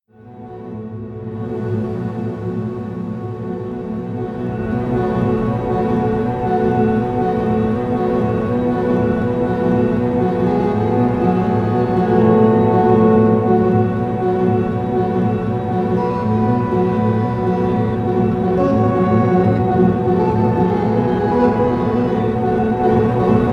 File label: machine drum